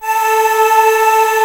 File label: TREMOLO